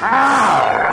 arr; ooh ahh

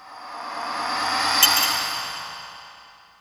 zreee, Swish

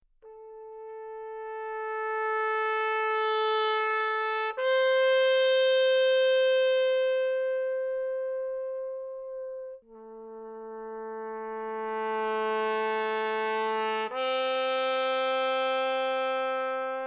horns, Sad

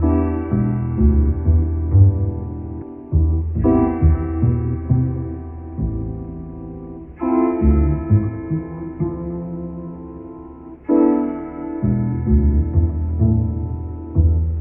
bass, Loops

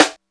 juiced, Snares Knocks

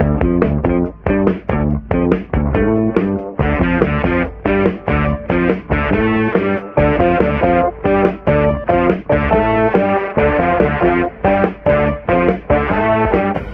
full build to a horn section, Loops, Phonky

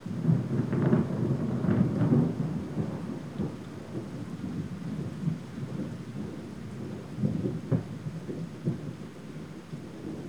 thunder distant

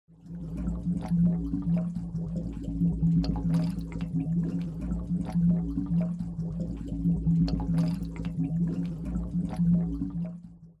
slime

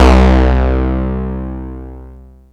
raunch, Synths; Notes

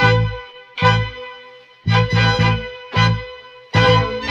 strings stacatto strikes; Loops; Phonky

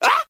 "ah"

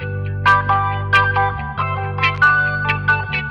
keys; Phonky

